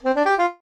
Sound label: jingles saxophone; Kenney; Music Jingles